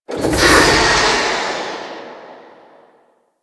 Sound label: Stream Blast F; Warframe; Ambience; Grineer; Grn Hanger